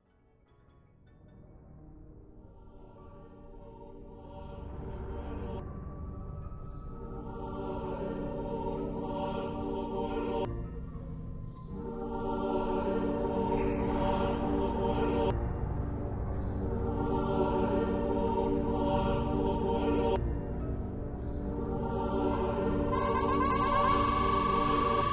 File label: Rave Version